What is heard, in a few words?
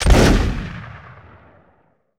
Musket Single Shot Close; Period Combat; Sony Pictures Sound Effects Series